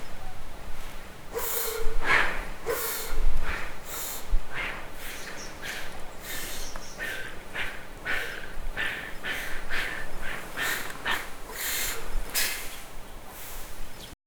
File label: walking scary, creepy